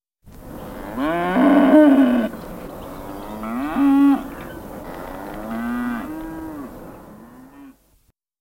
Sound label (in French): vache